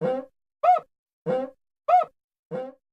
cuica sound

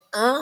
moan

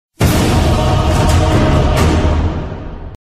match ready sound, bong sound; dota 2

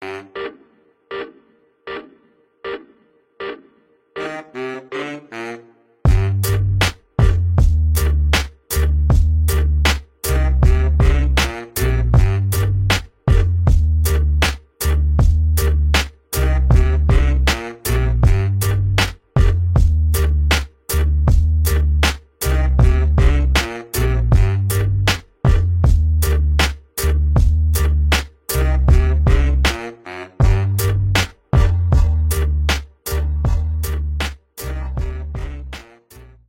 Beat

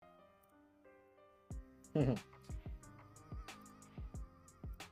hehehe

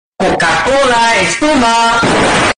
cola explosion

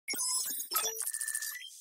tech beeping

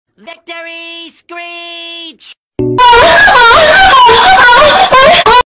victory screech olololo

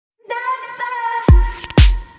latch

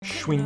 schwing; Latias SCHWING

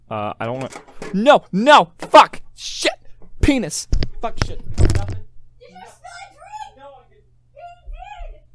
action spilling drink; Did you just spill?

